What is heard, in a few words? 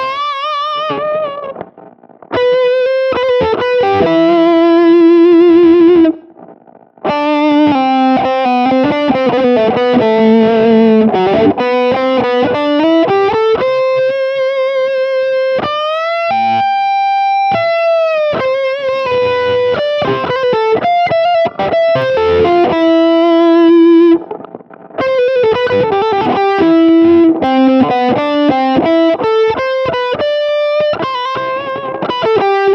stems Dry